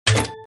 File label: Sfx Click